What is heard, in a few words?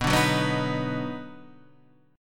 D sharp Minor Major 7th Sharp 5th B, chord